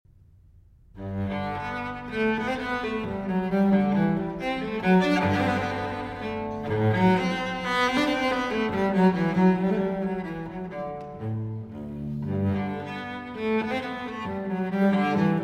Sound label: Cello Suite No. 1 in G Major, Menuets I & II; BWV 1007